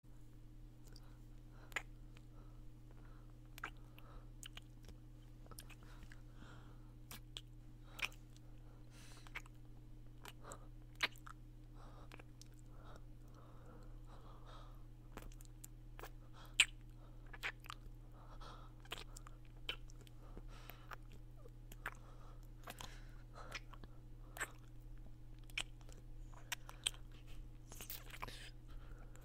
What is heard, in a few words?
sucking